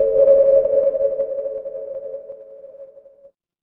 magic scifi alien

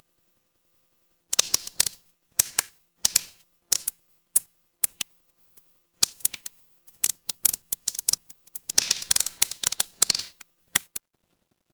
ambience fireplace

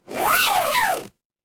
paper fabric zipper

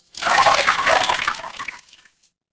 water swirl

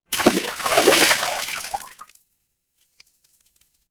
water splash

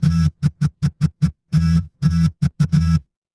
office phone vibrate

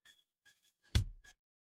ui modal close

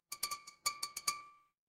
glass glass tap

